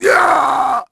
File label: DEATH; NIXON; Pulse Racer